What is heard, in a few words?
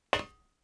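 metal walk left, footsteps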